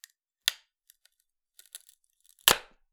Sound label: Palette, WoodenPalette